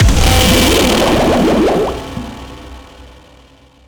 blood laser long

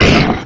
monster grunt

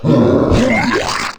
fistula puke; finalmother

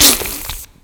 spider spit roar

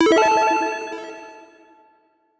pickup dime

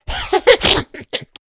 laugh

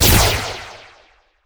laserring weak

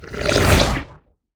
blood laser long end

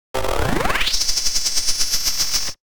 phase 2 prepare to spin; dogma

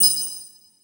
soul pickup

